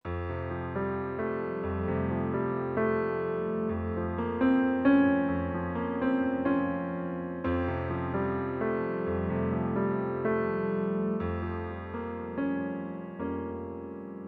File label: Piano Solo; deadly premonition the director's cut